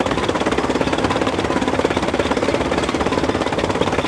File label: treads tractor